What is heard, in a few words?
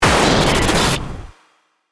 Hit Burst Complex; Sound Design; Blast Wave